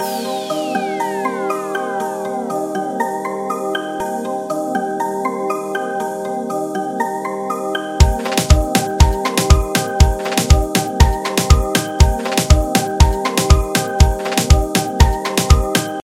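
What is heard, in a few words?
looped melody with moombahton arrangement; razgon zaciklennaya melodiya s aranzhirovkoy mumbaton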